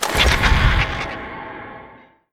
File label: draw, weapons